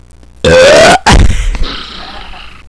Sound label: burp